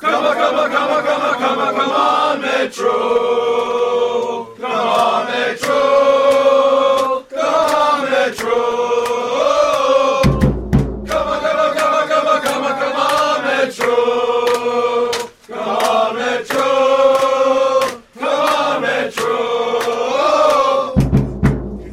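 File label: come metros, football chants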